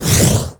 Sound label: Flame Laser Stop; Warframe; Corpus; Corpus Railjack; Spec Ops Fire Moa